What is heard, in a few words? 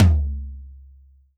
drum, WORLD DRUMS; World Instruments 2; PS MIXED DRUMS